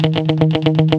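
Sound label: GUI 120, guitar; Best Service Gigapack 2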